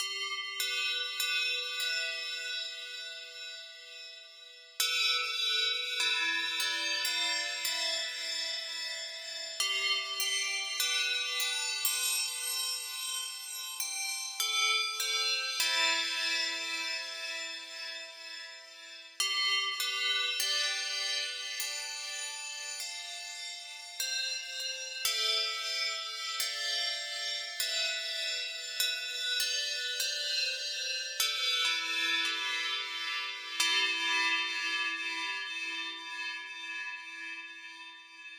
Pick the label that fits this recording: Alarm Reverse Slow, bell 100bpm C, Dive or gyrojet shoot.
bell 100bpm C